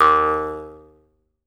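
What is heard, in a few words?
Jaw Harps, Pitched Percussion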